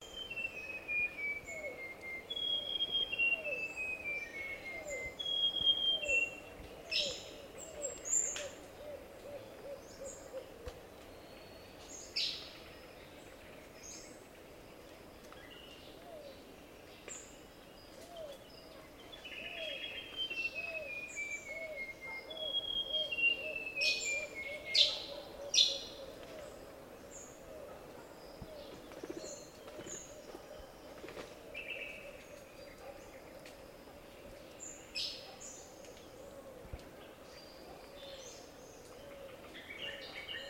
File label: Plain gerygone